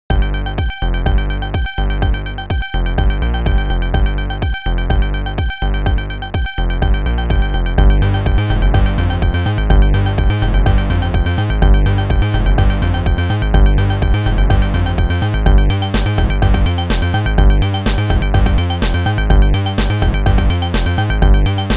techno chips; paradox; monty

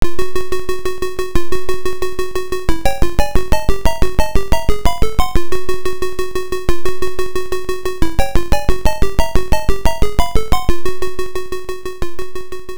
son son stronghold background music; arcade

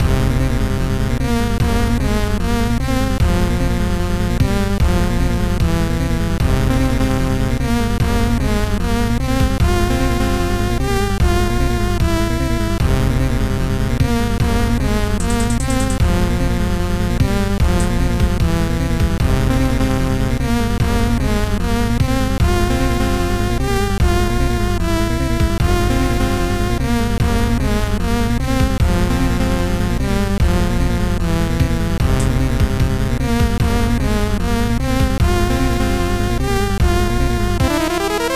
amiga in game; golden axe

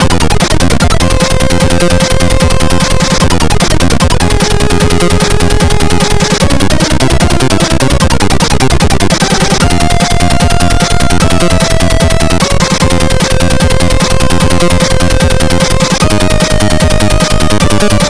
c64 in game; r type

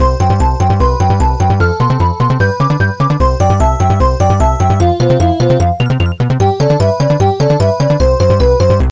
amiga in game

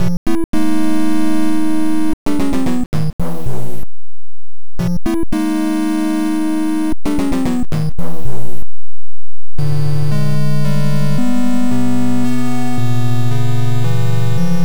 nes fortress background; super mario bros 3

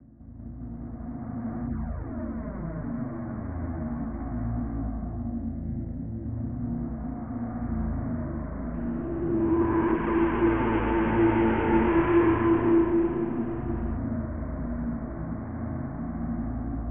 psycho spooky; budbrain

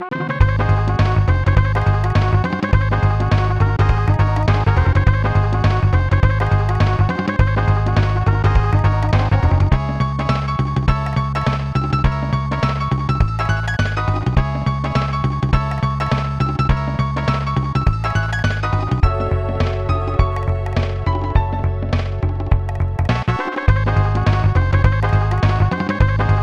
snes swing; donkey kong country 2

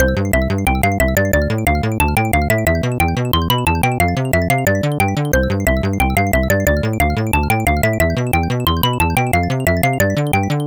power up, arcade; the newzealand story

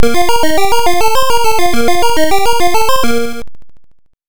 arcade step passage; moon patrol

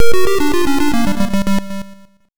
arcade game over; arkanoid

